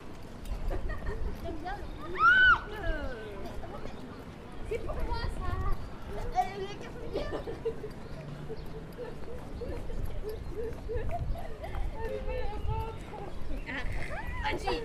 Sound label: Giggling french girls